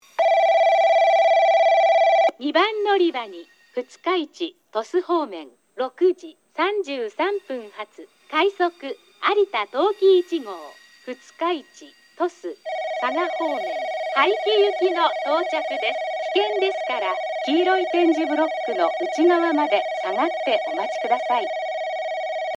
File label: minamifukuoka rapid arita tokiichi; 南福岡駅 接近放送（快速 早岐行き）